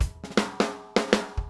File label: Drums, Rock